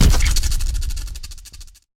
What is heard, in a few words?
Electrocution; Damage